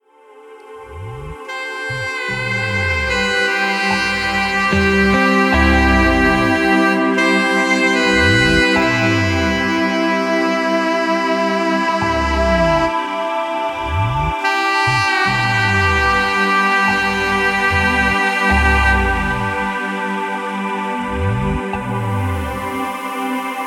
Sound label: lonley saxophone man